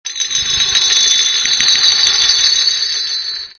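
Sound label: bell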